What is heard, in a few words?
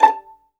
STR 2 PIZZ A5, String Sections, Violins Pizz; Roland LCDP13